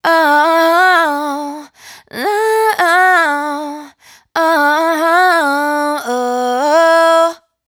riff Uh Woah 110 D, Dry Vocals; Raw Vocals; Slate Digital Omnivox Vocal Sample Pack

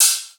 hats, Pop; Drums; Slate; SD PASADENA Pop Sample Pack

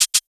perc dub shaker; Drum Sample Pack; One Shots; Slate; SEISMIC

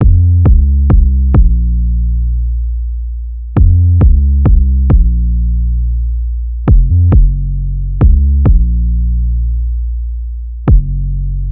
Synth loop 135 Bbm, Synth Bass Loops; Slate Digital BASSHOLE Sample Pack

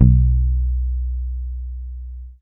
Bass Muted Long B, Electric Bass One Shots; Slate Digital BASSHOLE Sample Pack

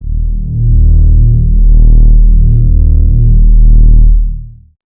Synth one shot Long Eb, Bass; Synth Bass One Shots; Slate Digital BASSHOLE Sample Pack

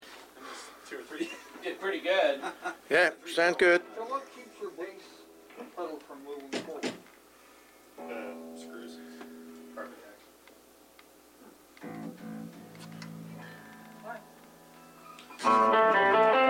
Background Chatter Mix

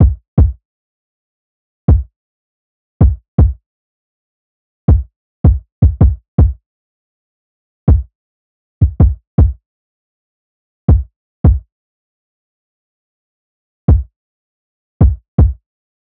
kick 80, Kick Loops; drum sample pack; Fantale; SEISMIC; Slate

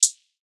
hat soft; Drum Sample Pack; One Shots; Slate; SEISMIC

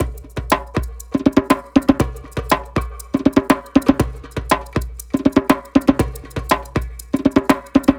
percgroove 120, Perc Grooves; Slate; SD SEISMIC Drum Sample Pack; Signature Perc Grooves

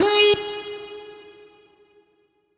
Vocal one shot Ab

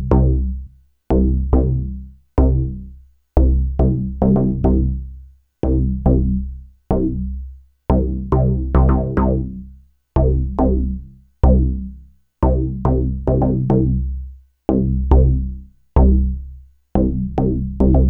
Synth loop 106 C, Synth Bass Loops; Slate Digital BASSHOLE Sample Pack

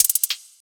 hat, drum sample; Hats

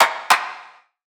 snare oneshot, Pop Sample Pack

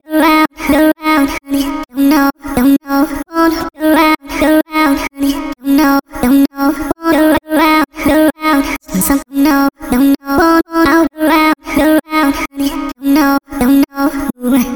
songstarter 130 B, Pop; Slate; SD PASADENA Pop Sample Pack

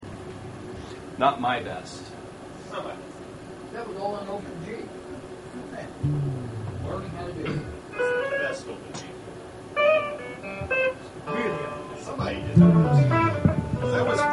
Background Mic Mix

